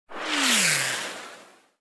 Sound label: bullet passby; War Thunder